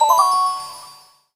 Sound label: Move Bgm End; Gachi Yagura